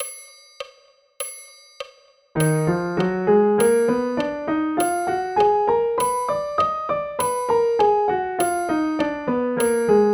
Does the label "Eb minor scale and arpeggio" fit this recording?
Yes